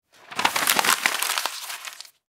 crumpled paper

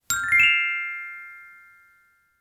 good idea, idea sparkle

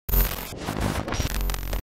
RF Switcher

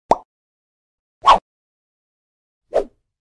Transition Sound Effect; trending reel sound effects

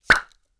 Suction Pop; soft alert pop

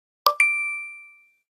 apple pay sound effect

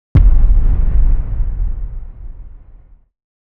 Struck Down

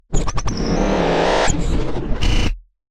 Terminal Glitch

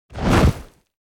Jump Swish

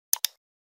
Click Sound Effect; clicked fx

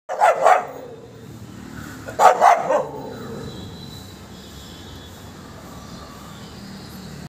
dog barking sound effect

